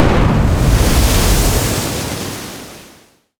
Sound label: ship miss big effect; Hearts of Iron IV Death or Dishonor